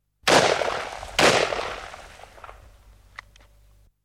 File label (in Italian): FUCILE SPARI